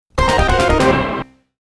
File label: Failed; Legend of Joe Musashi Shinobi Music Collection